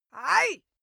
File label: female attack